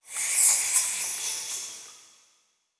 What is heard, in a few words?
Shimmer, Hiss, Retracte, Snakish; Universal SFX